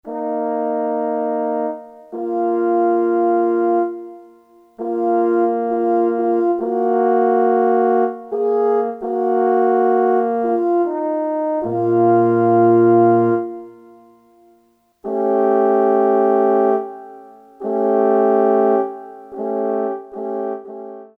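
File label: French HORNS, Korg DSS-1; Brass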